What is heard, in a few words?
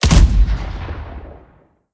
Artillery; blue archive